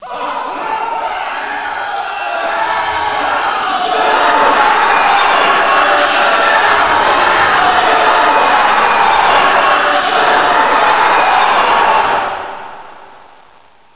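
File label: ultra scream right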